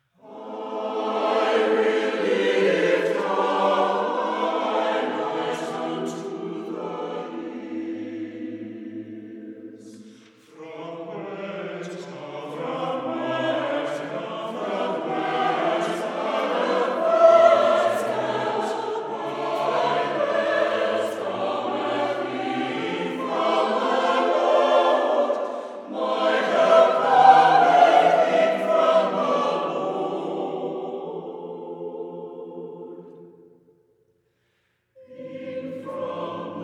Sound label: Chorale; Coventry; I Will Lift Up Mine Eyes